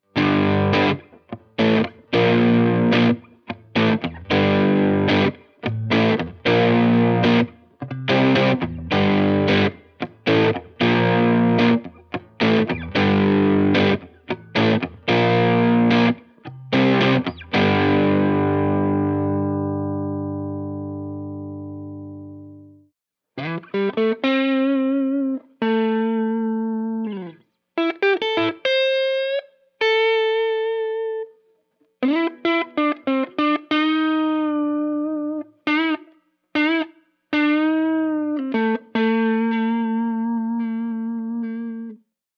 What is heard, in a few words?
neck dirty, pickups